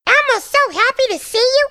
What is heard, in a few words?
hunter pounce; catnap n grabpack; elmo